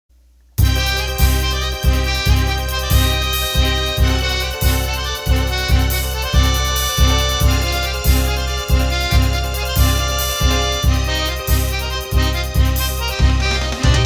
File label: Argentine, accordion